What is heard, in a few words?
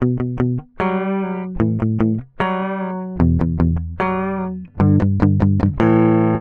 guitar dry